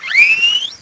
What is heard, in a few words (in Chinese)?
海豚 攻击; 红色警戒2; RA2; 作者：西木工作室